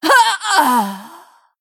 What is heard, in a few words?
Female Human Death